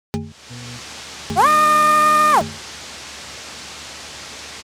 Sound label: power on no backfeed, printer; zt231